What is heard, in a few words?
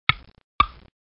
tick, clock